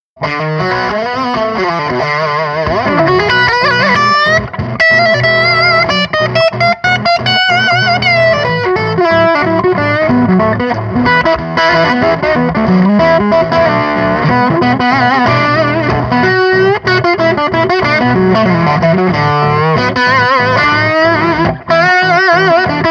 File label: blues